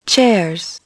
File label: chairs